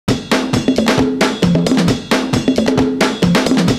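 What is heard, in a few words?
percussion ride, 133.5; Univers Sons - Kitch 70s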